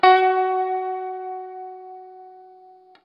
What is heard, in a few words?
Guitar & Bass; Quantum Leap; 90's Sample CDs